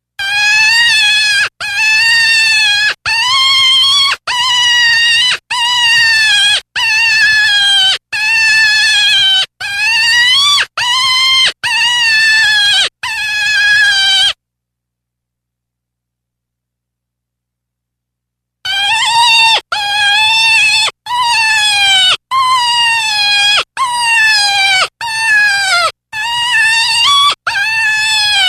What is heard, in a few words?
Fawn Distress, Deer; ORION